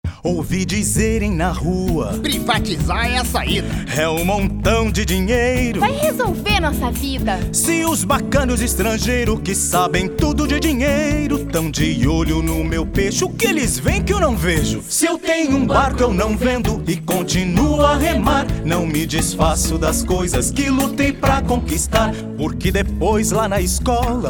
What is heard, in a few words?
Jingle; Mucap